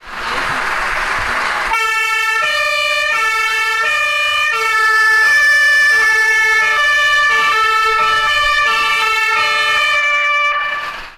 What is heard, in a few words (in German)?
feuerwehr horn